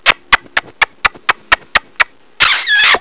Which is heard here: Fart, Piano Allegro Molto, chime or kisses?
kisses